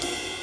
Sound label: Ride; Touch Loops; ClassicBreakSessions